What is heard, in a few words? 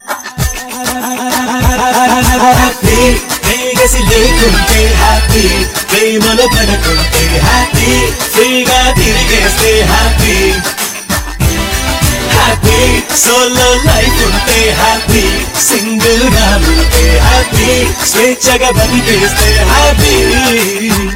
Happy hipster